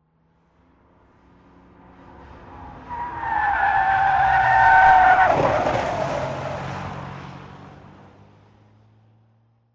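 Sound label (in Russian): занос с наклоном и опусканием машины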